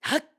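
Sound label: Attack Two Handed, voice; Link; BOTW; Breath of the Wild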